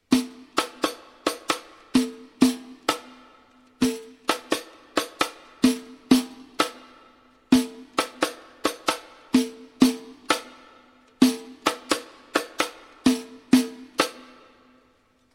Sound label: riq